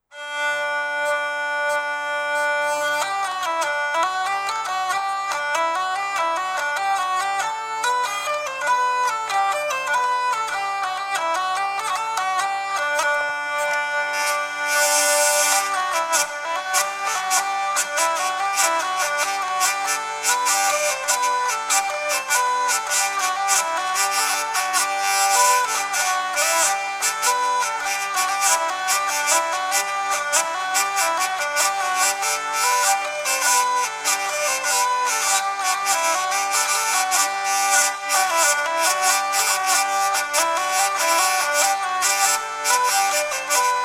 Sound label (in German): Drehleier beisp